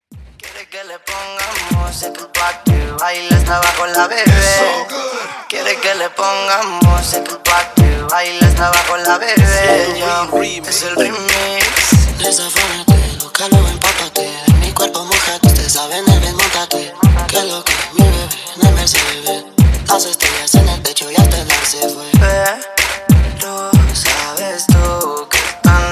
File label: Hip Hop Beats Latino Re Drum 94 BPM